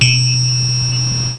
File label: sparkle